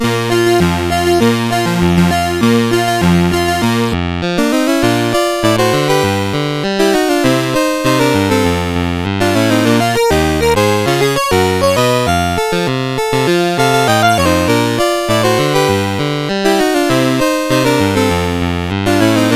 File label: CSG8580; CSG8580R5